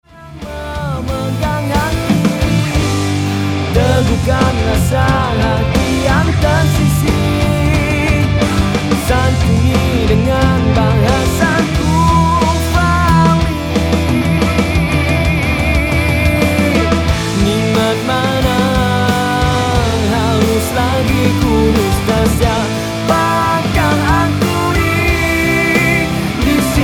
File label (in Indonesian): Alternatif Rock